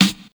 Natural Snare One Shot G Key, steel snare drum sound tuned to the G note; snare drum samples